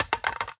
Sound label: phys mag drop ak metal concrete, magazine drops